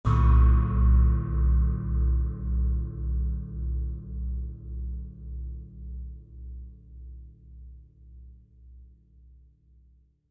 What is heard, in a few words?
China 16 Cymbal Medium Tap